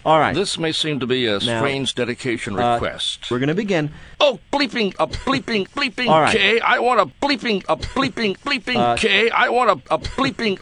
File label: bleeping